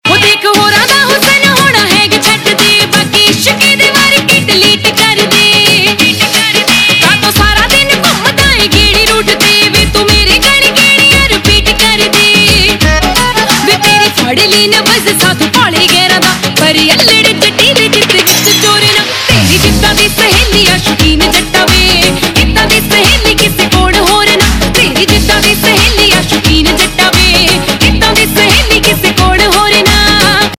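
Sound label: Ringtone, Punjabi Pop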